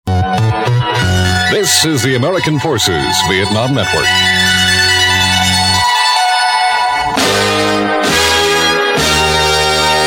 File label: Jingles; AFVN; American Forces Vietnam Network; PAMS; 1967